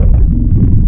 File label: cello; Adventure Kid